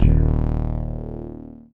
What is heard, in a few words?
STRANGE BASS; Masterbits; Dance Trax